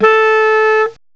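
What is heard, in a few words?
clarinet high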